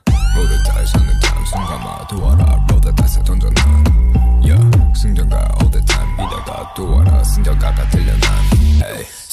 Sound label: Victory Song; Felix